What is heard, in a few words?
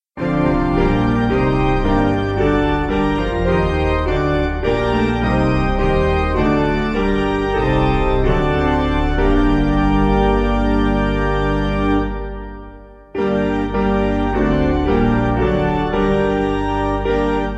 O Piano